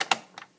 +2A rewind on, Tape recorder; Mass storage; zxsp

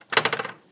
open empty, Tape recorder; Mass storage; zxsp

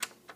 pause on, Tape recorder; Mass storage; zxsp

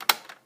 rewind off, Tape recorder +2A; Mass storage; zxsp

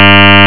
meep; Mass storage; plus3 disk; zxsp

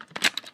eject, Floppy +3; Mass storage; plus3 disk; zxsp

